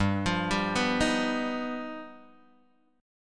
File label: G Augmented 7th, Guitar Open G